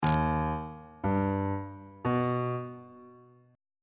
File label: c melodic minor chord 3 is e flat augmented on bass clef